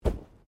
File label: Bodymovement Fall Light